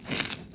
foot snow, footsteps; midnight guns

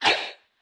throw, player; midnight guns

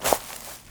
foot grass, footsteps; midnight guns

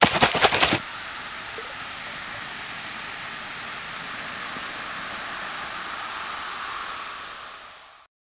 shake; midnight guns

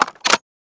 ssg bolt; midnight guns

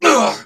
pain, male; bootsector male; midnight guns; bootsector male 00

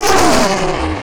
death, player; midnight guns

